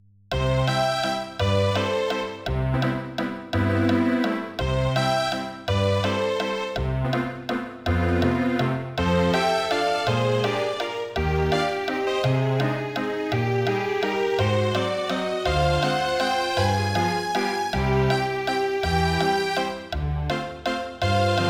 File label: Waltz Incidental